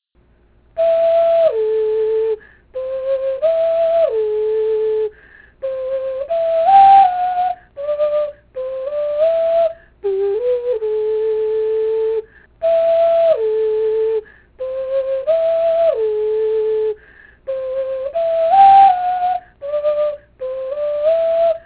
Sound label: ocarina